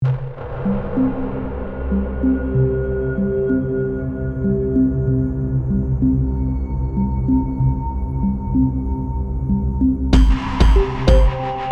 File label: Dance EDM